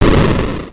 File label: Explode